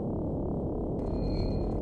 comp hum, ambience

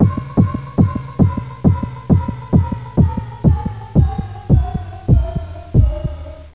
fast fire; dusk

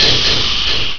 snail, lavagun

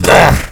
pain; sleeper

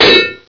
melee wall

